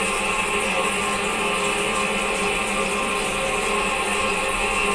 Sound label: toilet running